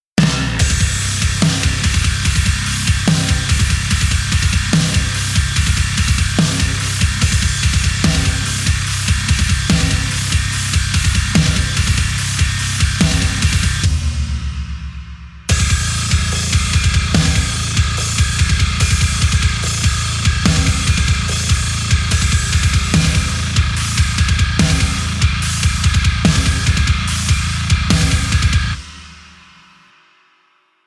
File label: bazzzzzzzz, disto bass tone